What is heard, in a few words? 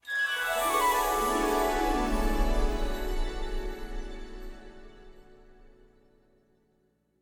start Screen Continue; fairyred